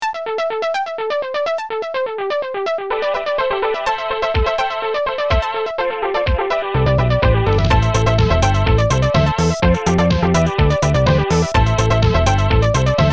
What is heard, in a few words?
vibe hit